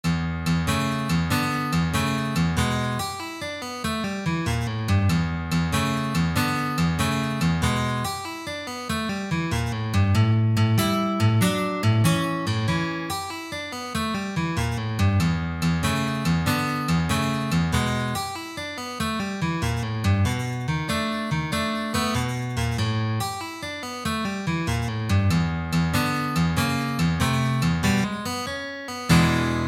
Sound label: Classic fingerpicking shuffle